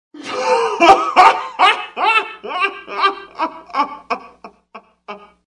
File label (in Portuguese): Efeitos Sonoros Risada; Vinhetas